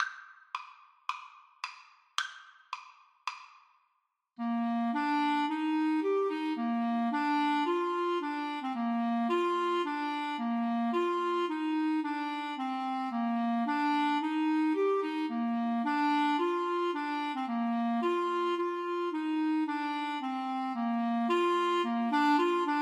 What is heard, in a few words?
in the sweet by and by Clarinet duet